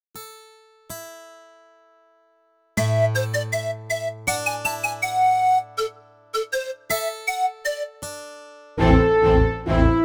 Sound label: Yamaha S90